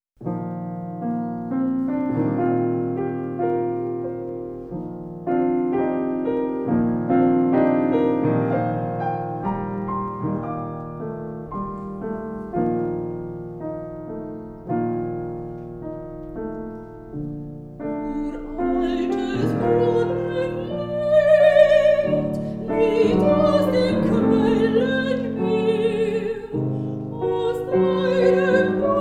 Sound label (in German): Lieder - Sopran, Klavier; Lieder Auswahl